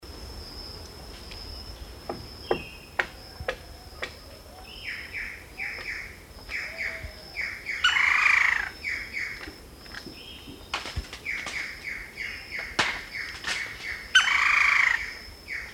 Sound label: Dryocopus lineatus Picamaderos listado